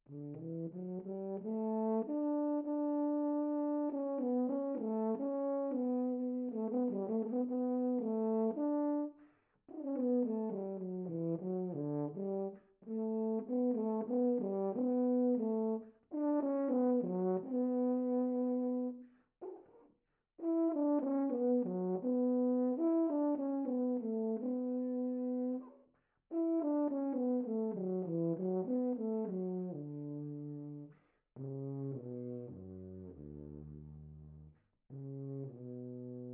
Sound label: tuba; vw